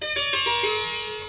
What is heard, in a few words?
Sitar; Durga Puja; goddess durga